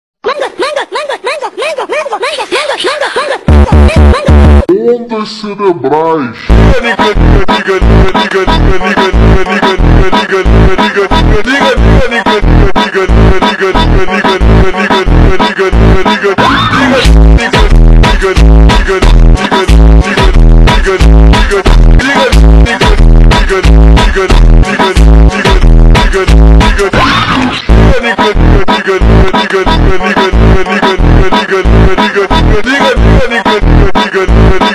MONTAGEM FUNK (Slowed + Reverb)

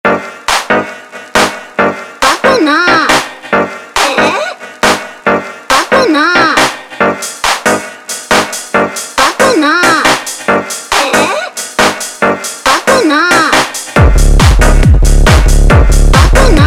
slowed Reverb, Phonk

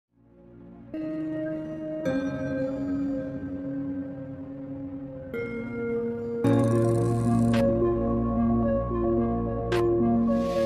Relax The Dreamy Atmosphere Electronics Slowed

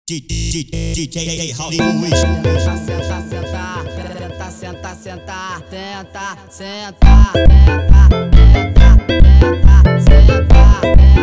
Funk Simple sped Up

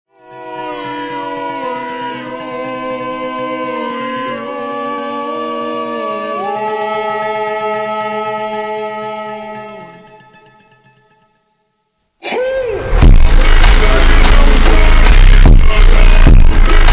ultra Slowed Reverb Bass

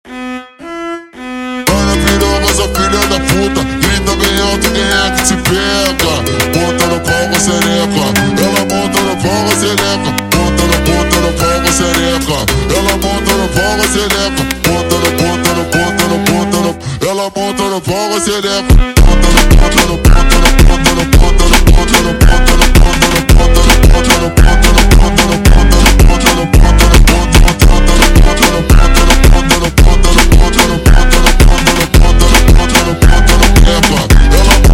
brega violino super slowed

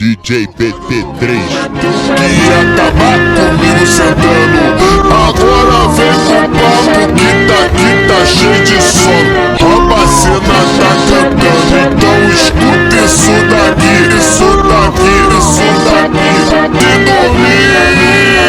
HORROR FUNK Ultra Slowed

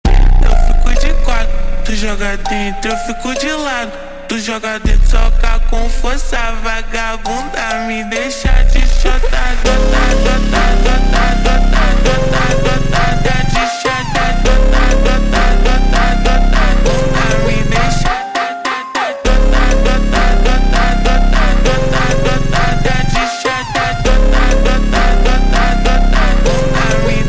super Slowed

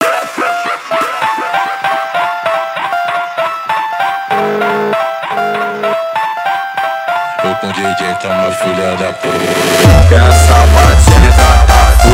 Hardstyle Remix Slowed reverb

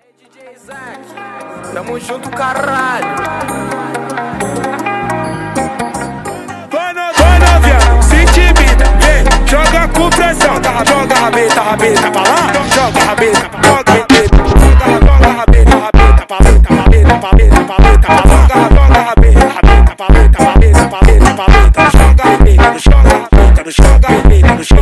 birds funk

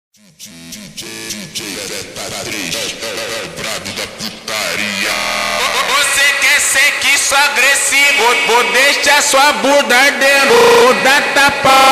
Deixar hardstyle Remix slowed

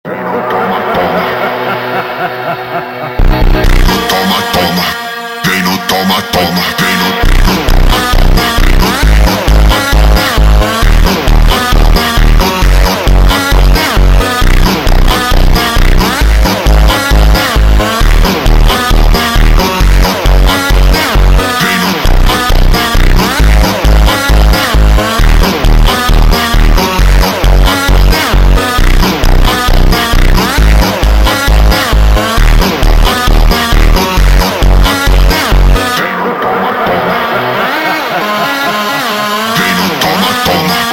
slowed Reverb, PHONK

